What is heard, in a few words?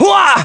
pain; trunks-fut